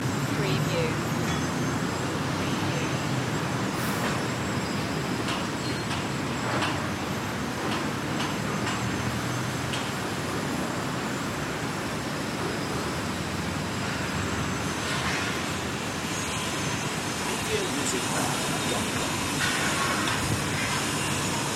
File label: rooftop city ambience loop